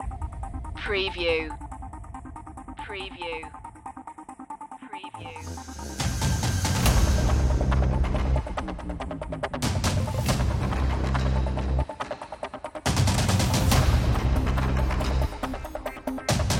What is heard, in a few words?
apocalypse no choirs